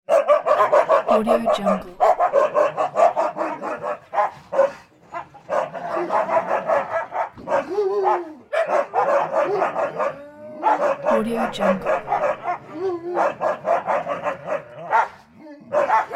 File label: husky dogs barking and howling angrily